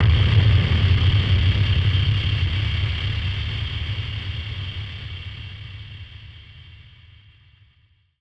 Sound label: Drum Bomb; musicradar; bomb factory; Drum Bombs